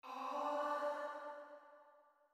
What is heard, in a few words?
Chime; chime sharp